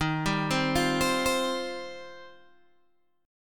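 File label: E flat 6th Add 9th E flat, down, Guitar, Open G; arpeggiated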